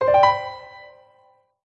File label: Sfx Golf